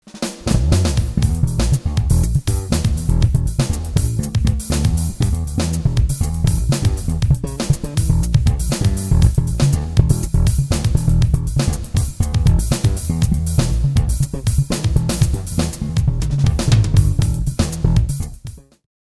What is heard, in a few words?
BASS